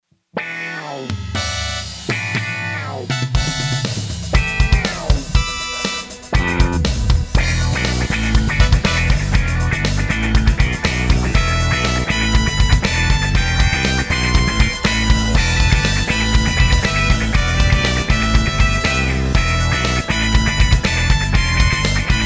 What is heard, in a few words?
Theme Horn